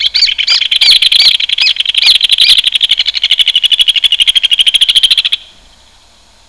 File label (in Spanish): hornero